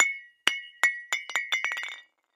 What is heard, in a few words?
Light Bulb Drop No Break; light bulbs